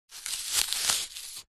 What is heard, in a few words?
food apple knife twist crush split